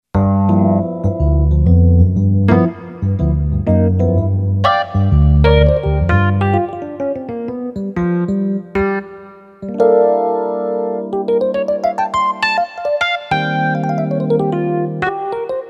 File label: Suitcase EP, Yamaha PSR-550; Voice